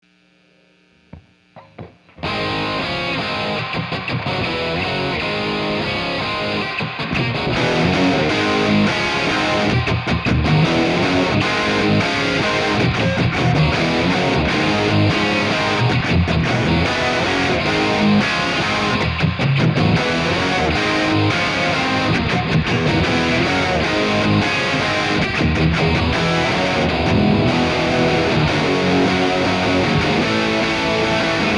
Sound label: riff